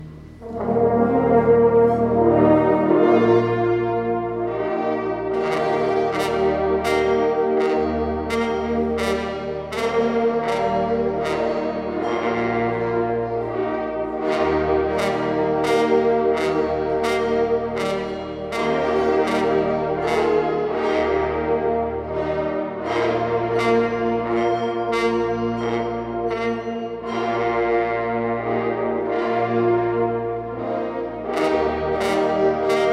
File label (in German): Glocken, parforcehornmusik